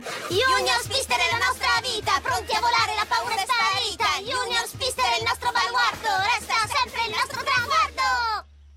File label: Chant (Italian); Junior Speedsters Chant; Season 1